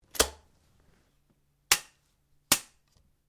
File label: SHOTGUN BAIKAL 12 GAUGE DOUBLE BARREL DRY FIRE SEQUENCE STEREO; A selection of domesttic firearms; Shotguns